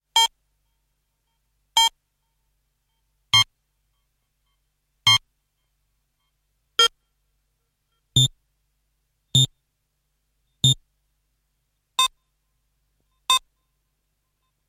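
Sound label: sounds of computer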